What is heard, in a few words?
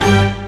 ORCH HIT; Hip Hop; E-MU Formula 4000 Series Vol. 1; Hip Hop Nation; Raw Phatt